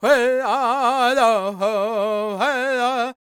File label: C# MIN, World Voices, LAKOTA